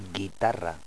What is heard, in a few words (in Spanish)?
Guitarra